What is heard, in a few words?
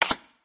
card slap